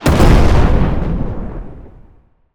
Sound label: explosion large; Gamemaster Audio; Guns, Bullets and Explosions